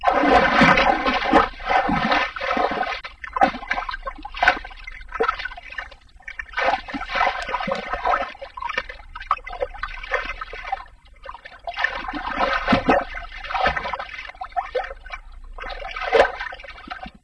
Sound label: swim splashes